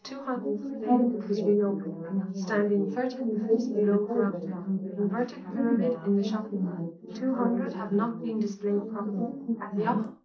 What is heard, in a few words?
female, 380ms 4cm; SiSEC11; underdetermined